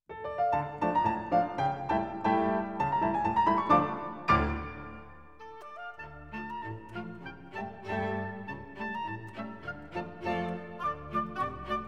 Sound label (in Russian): Italyanskaya polka